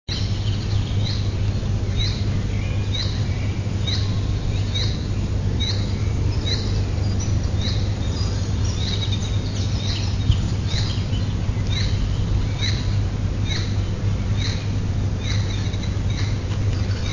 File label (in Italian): uccello